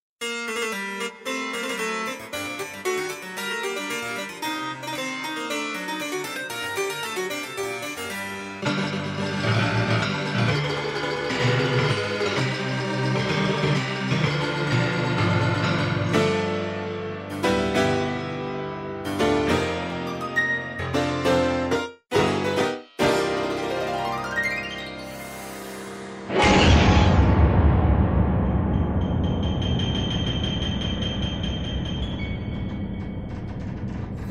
Total Piano; Best Service

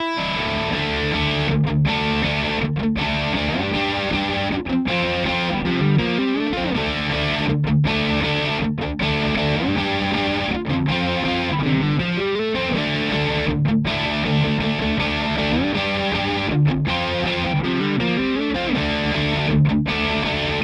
Schecter Banshee; Nebula Burst; Masterworks

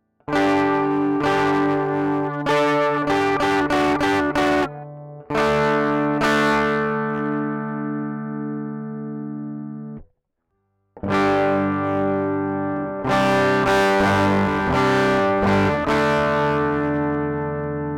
UK Sound 1173 DI Gain

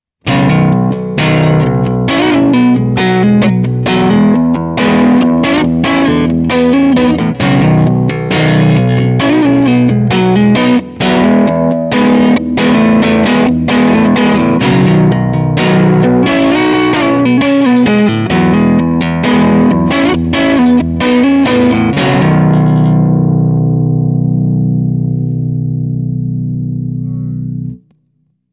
Neck Humbucker Crunch; Reverend Descent W

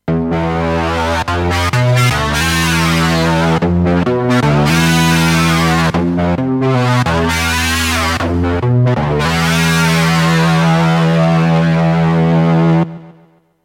ChainSaw Lead

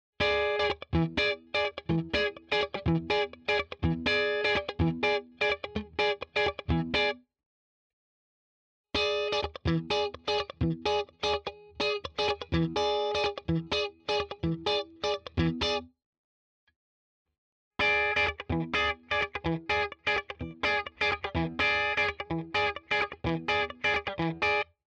JA 60 CC all positions clean Funk

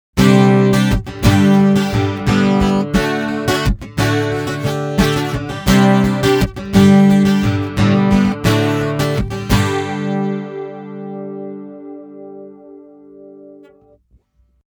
Trace Elliott Chorus

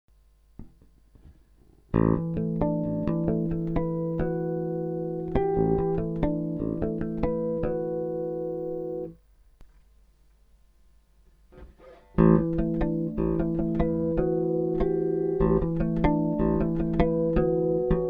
chorus; Dapper

